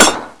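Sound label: crossbow hit, weapons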